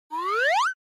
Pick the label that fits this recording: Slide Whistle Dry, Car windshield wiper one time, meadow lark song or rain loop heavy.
Slide Whistle Dry